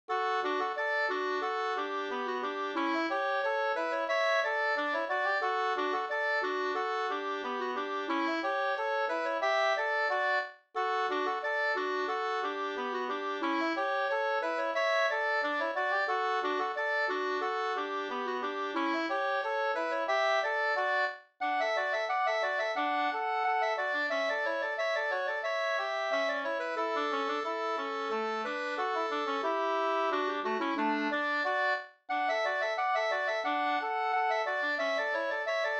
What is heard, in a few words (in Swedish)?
polka, polska 2-takt, 2st; "55 Polskor, Valser, Marscher, m.m. samlade af Nalle", nr 27